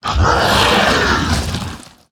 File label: Flesh Giant Play